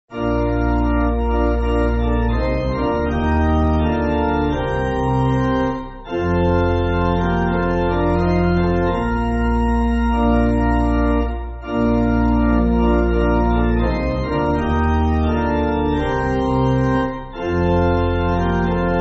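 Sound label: Pipe Organ, church music; The Friend Of The Sinners; Malagasy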